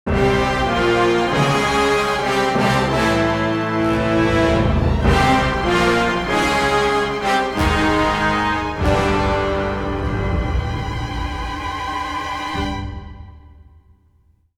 Dragon Motif; Indiana Jones and the Emperor's Tomb; Xbox

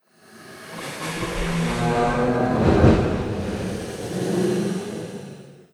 music - mystery; You Are Empty; metro mimo

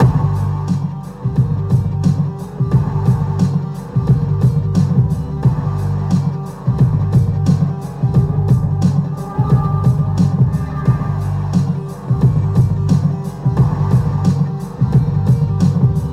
Radio Idle; Latino Ghetto Radio 3

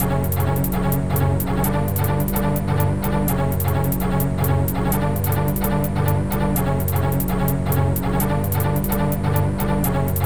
Soul Tomb Ambient; Perihelion The Prophecy; Amiga

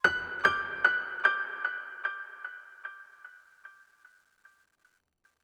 One shot Piano

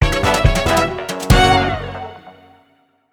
Success Stinger; Cook Serve Delicious

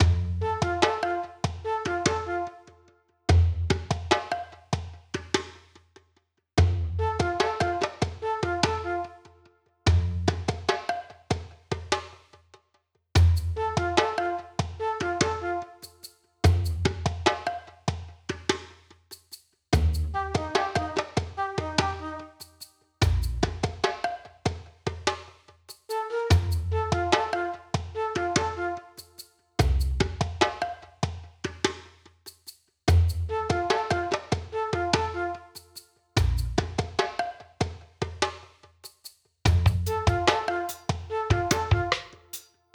Calm Bright NO GUITAR; Grand Battle

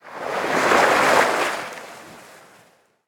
Beach Wave

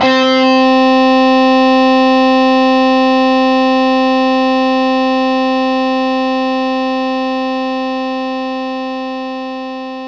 Overdrive Guitar C4

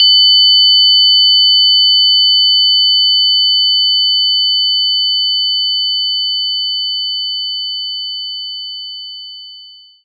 overtones

